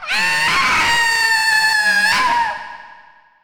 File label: aaaa; 女; 悲鳴１ あああぁぁぁぁ〜!!!; 激しい悲鳴 断末魔の叫び